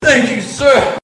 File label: proxy death; billy herrington; slender